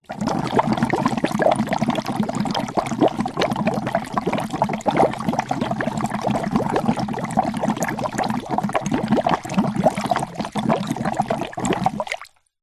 foley water bubble plastic container blow air through plastic pipe